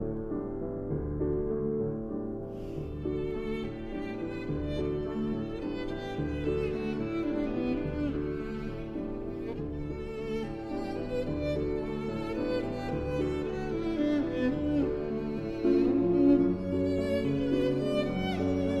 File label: Violin and Piano in D